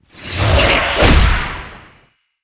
Old Fox Atk

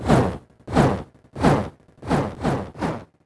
Vampire Bat Idle

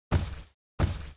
Tiger Back

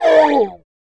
die

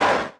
Ratman Dmg

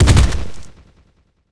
ape run; Seer; GamigoNA

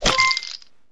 Dice Chips Piled Up Pair

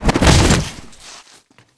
Earth Nerpa die; Seer; GamigoNA

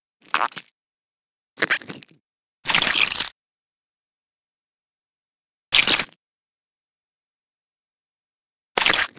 snail action

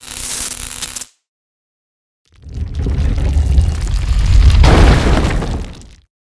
Door Open; Fiesta V.3; Nyx

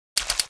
Scorpion Run; Seer; GamigoNA

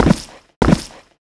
Walk

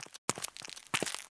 Heart Mouse Walk

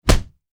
Damage

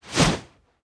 ball crush walk

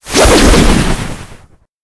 snow ball action

